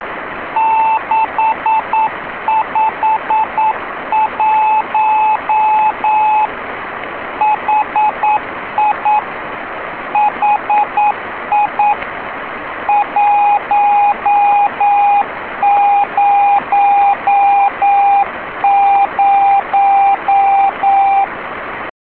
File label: slow CW